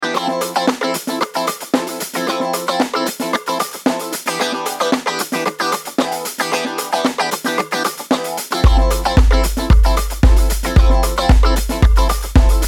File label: Dreamy groove